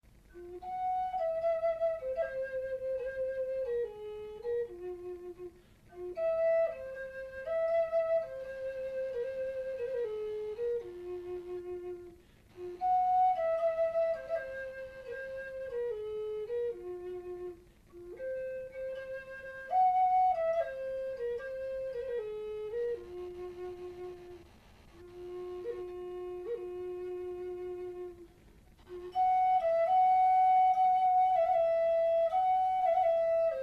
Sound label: Lakota Wedding Song, flute